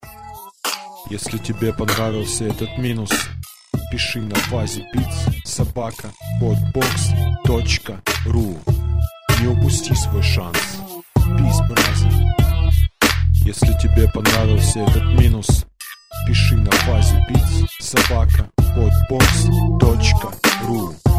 funky

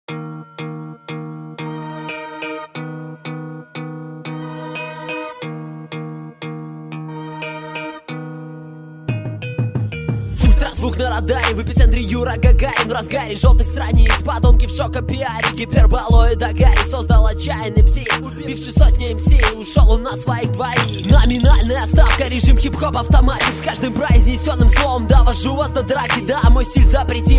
hip-hop

